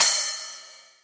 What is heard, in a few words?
huge crash cymbal one shot c sharp key, Royality free crash tuned to the C# note